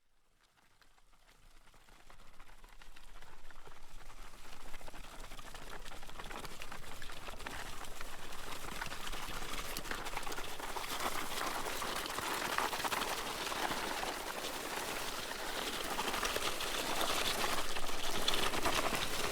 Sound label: dragging on gravel